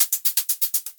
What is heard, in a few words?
Hat, 122 BPM; Premium Hat Collection; mmb studio melodic